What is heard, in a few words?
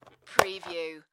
Pencil Sharpener